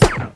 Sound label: cg soft, weapons; Tribes2